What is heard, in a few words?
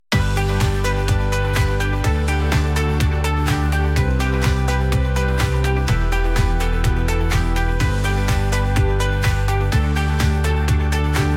Techno Triumph